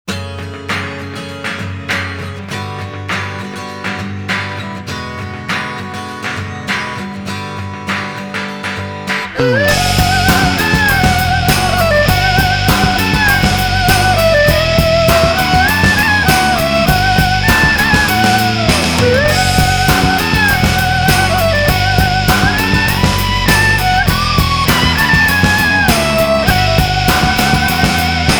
instrumental; tenchu senran; IZAYOI

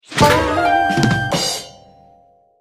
Unlock Secret level; bad piggies; android; mobile; windows

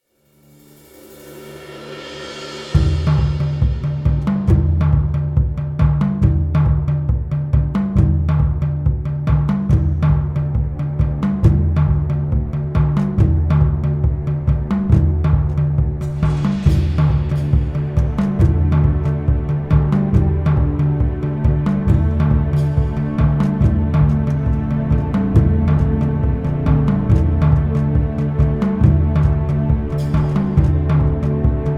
Island Drums; Port Royale 3

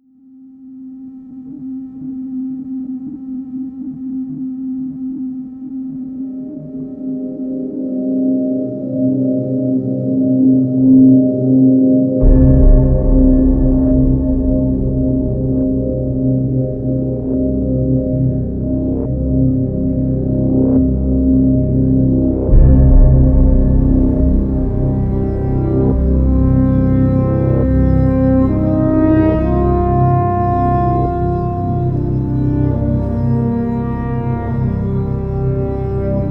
suspense; Call of Duty Modern Warfare Remastered

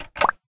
button click

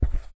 footsteps carpet Walk; Poppy Playtime

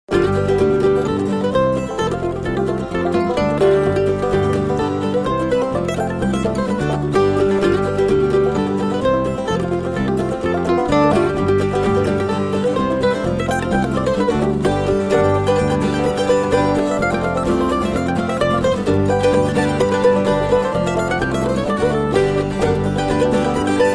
New Train Music; Sid Meier's Railroads

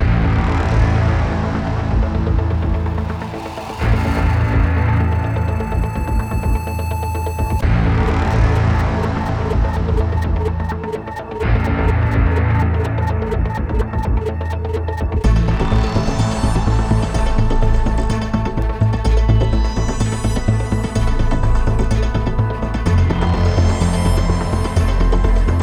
Low Combo; Alien Mothership

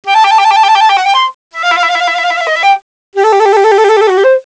Flute; kentei kyoukai imasara hito ni wa kikenai otona no joushiki ryoku training ds

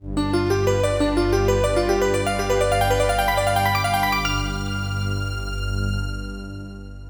Success; Kakyuusei; Saturn

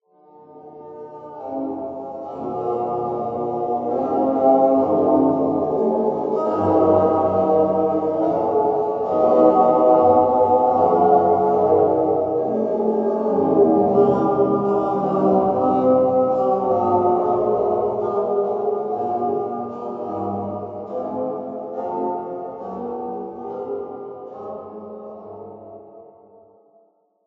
Jazz

